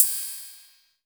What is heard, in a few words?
TR-606 Ride Cym; Roland BK-5